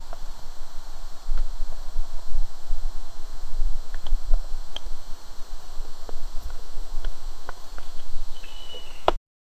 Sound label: fast cutting tree; khulari; wood